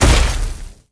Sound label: step run